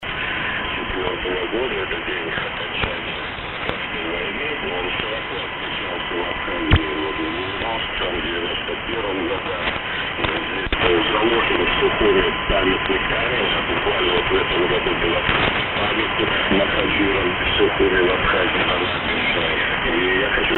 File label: 12080khz; Radio Liberty